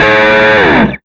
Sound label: 125 FX GTR, Big Beat Drumloops; USB Soundscan vol.25; AKAI